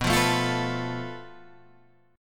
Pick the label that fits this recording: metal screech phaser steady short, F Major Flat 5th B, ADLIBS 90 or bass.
F Major Flat 5th B